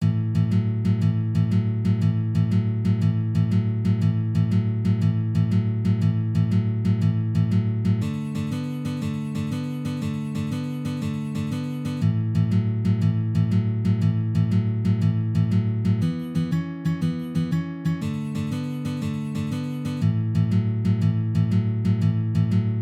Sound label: 12 bar blues shuffle fast, guitar